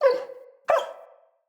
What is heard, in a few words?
Wolf Cub Trapped Call Far; Animals; Wolf Cubs; Orengal Wolf Cub A